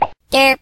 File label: pop derp